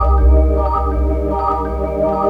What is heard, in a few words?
Loop Pad, Jungle; Pads; Blu Mar Ten; Jungle - 1989 to 1999